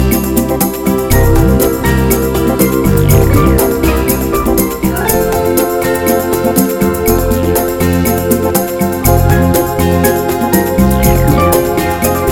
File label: Pretty and Cool Pop